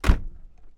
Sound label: volvo pv4 door close hard; Volvo PV4 1929; AMBEO; Pole Position